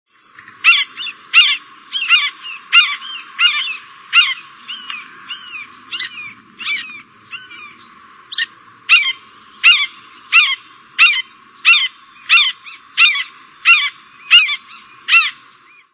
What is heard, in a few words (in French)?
buse variable cri; oiseaux; rapaces diurnes; parcours santé